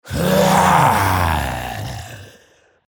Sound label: hhararrrlhhhh